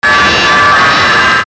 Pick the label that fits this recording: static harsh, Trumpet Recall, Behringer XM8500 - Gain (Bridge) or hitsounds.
static harsh